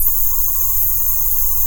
HI-PHONIC; Best Service Dream Experience; HI-PHONIC 2

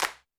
percussion, Roland TD-20

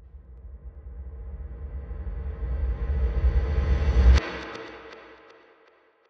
Reverse

